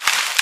bean

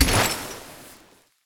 holy water; halloween; helsing

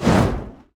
pandas ranged fire; wukong tower

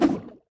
basic Attack cast; battleBrewmasters

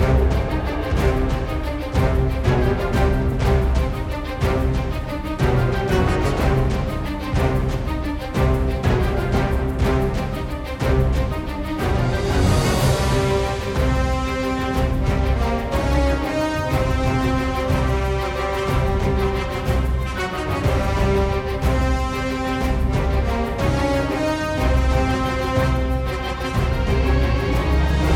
bg music main Menu

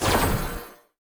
basic attack ranged; heroes nyru

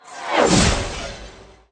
kill ray; veznan; faustus

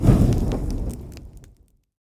campfire on; sfx

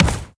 tower log Bounce

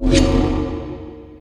minotauro portal

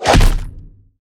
hit enemy; crocs; abominor

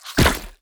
heroes basic Attack; grimson

